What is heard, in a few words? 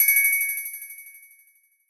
system click; anemoi